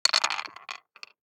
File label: DICE on Wooden Tray, Throw and Roll, Standard, 6 Six Dice; Samples; ArticulatedSounds; DICE Sfx Party (Extended)